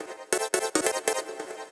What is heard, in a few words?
TECHNO SYNTH 140; Keys